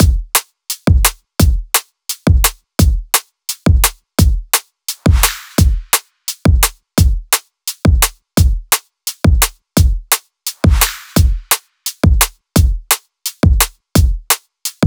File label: Drum Beat 172bpm, drum bass; DNB BASS PAK; DECODEDDRUMBASS Zenhiser